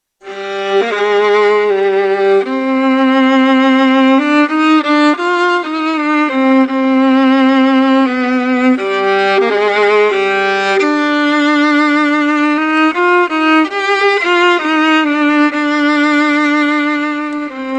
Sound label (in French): violon alto